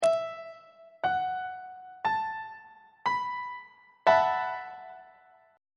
a double sharp dominant 7th suspended 4th 1st inversion; treble clef